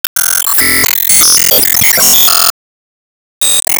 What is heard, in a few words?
Sys Mission Complete